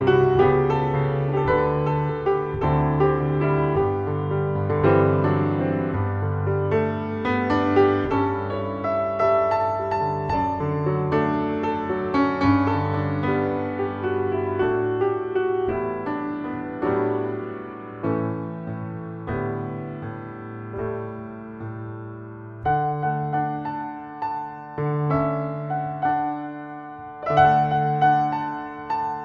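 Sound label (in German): Improvisation am Flügel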